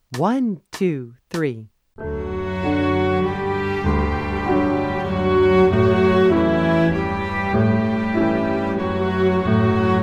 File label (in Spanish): Barcarola, violin